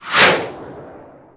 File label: bazooka fire; WAR